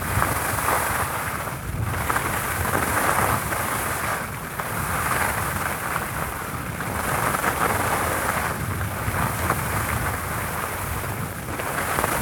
bike snow

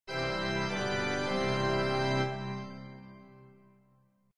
Church organ; Piano app by Yokee

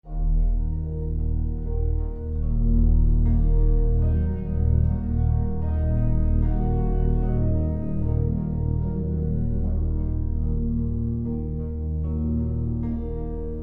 Eerie; the walking dead michonne

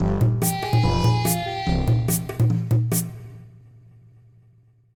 Level Start; Bricks of Egypt; macOS; Windows

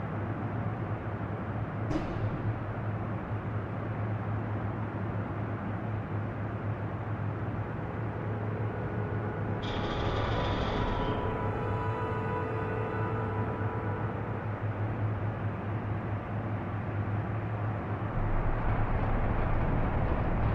Bespin Ambience; Angry Birds Star Wars; android game music

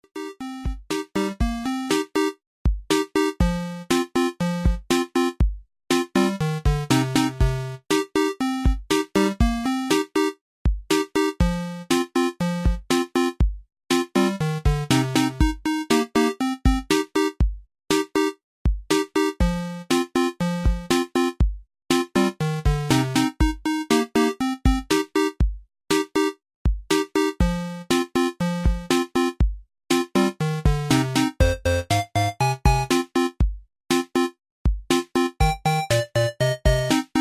Lobby Music; Kahoot; Ghost Mode Lobby Music

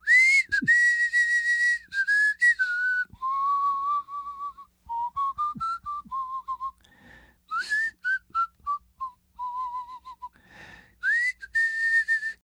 whistle; lucius

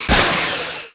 TURBO

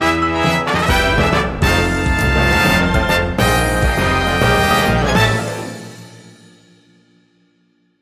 Level Completed

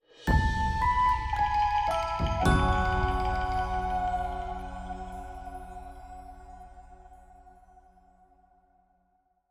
complete Quest Stinger F sharp

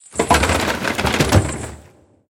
Refrigerator Long; Peace, Death

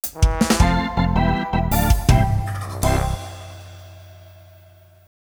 Lose; Micro Machines; GameCube

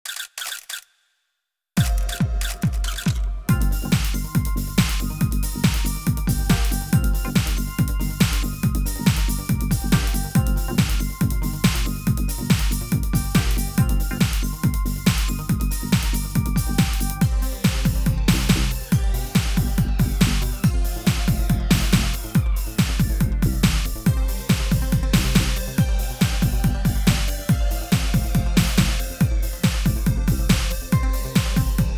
Techno Mix; Barbie Digital Makeover

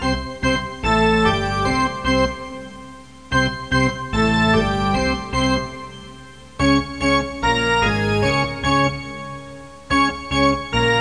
Baseball; Kid Pix Studio Deluxe

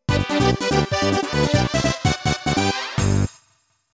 Duel Win

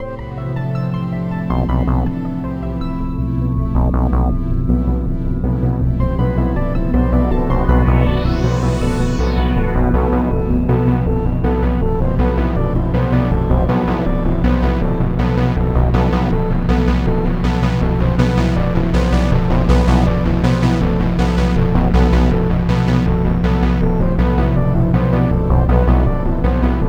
tension rising; the warriors 2005 ps2

